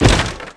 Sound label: bonk wood; terrains; Tony Hawk's Project 8; XBOX